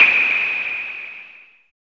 JUNO NOISE, JUNO PERC; Drums In Your FACE!

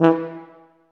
TUBA, Tuba stacc; Roland L-CDX-03 Disk 2

